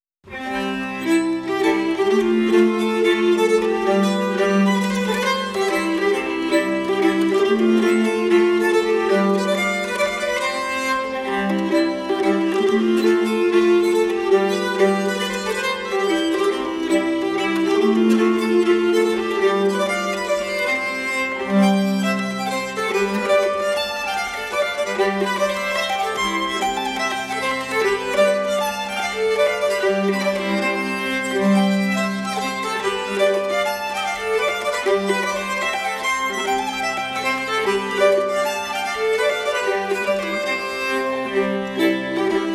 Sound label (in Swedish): Polska i C-dur; efter Styfberg